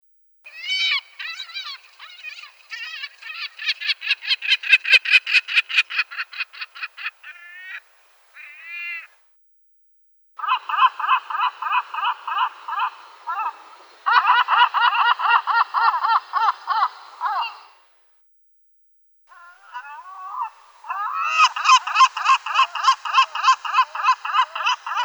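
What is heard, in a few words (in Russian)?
Klusha vostochnaya khaley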